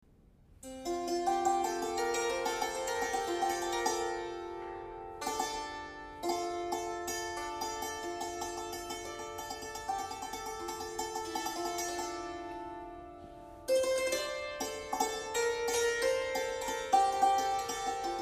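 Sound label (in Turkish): santur, halk çalgıları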